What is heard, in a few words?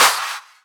Claps; Vengeance; Essential Clubsounds Vol.4